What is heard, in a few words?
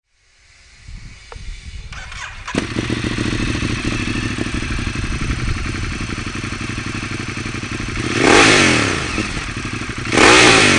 ducati monster 1200